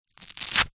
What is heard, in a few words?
sport goalkeeper glove velcro strap pull